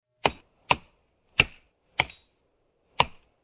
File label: axe, SHIPYARD; AMBIENT; Pirates Of The Caribbean